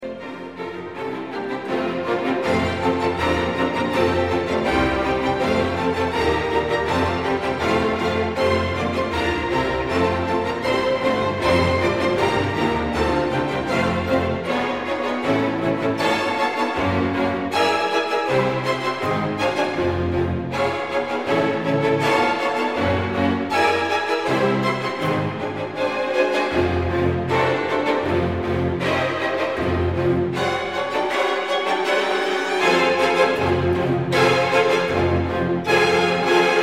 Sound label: Capriol Suite for string orchestra, Mattachins; Warlock